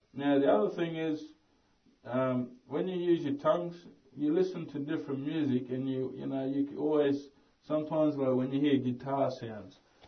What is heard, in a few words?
Didgeridoo teach